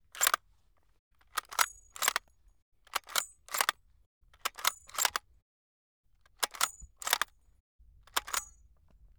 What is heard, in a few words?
K98k, Handling, Various, 1m, Right, MKH8060, Mauser Karabiner 98 kurz K98k bolt-action rifle; Pole Position